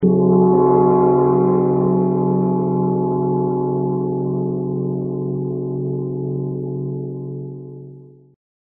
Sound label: gong